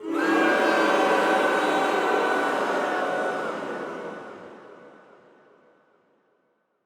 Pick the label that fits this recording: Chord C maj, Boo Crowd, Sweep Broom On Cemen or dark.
Boo Crowd